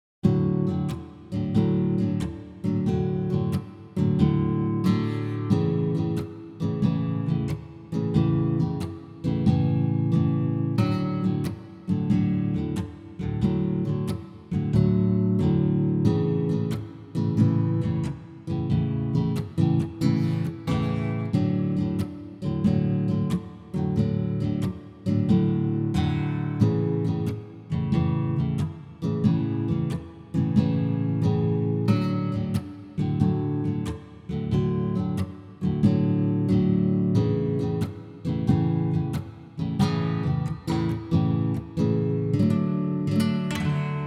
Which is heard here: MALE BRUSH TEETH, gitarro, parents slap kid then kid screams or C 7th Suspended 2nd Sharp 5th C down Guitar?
gitarro